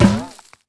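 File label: ball bounce; Crash Bandicoot The Wrath Of Cortex; XBOX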